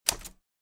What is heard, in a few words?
Typewriter vintage key pressing